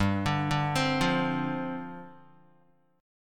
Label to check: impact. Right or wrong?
Wrong